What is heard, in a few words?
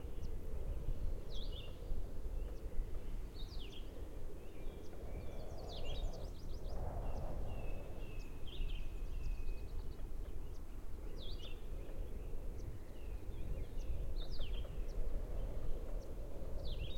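ambience simmer springs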